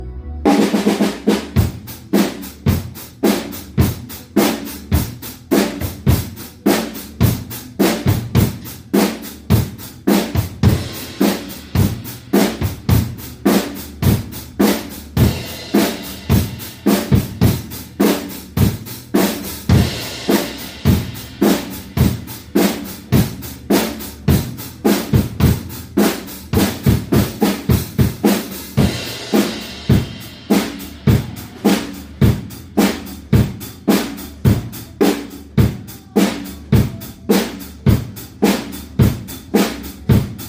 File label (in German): Schlagzeug; Zeughaus